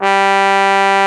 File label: Trombone B1